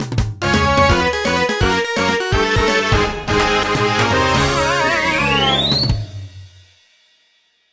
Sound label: Game Over, MIDI; Gokujyo Parodius